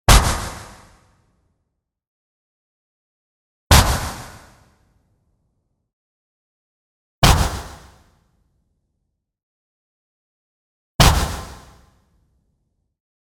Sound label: IMPACT WHITE NOISE Punchy Room Reverb; boom library; Anime Essentials